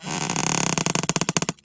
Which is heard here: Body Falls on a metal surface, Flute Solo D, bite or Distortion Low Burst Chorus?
bite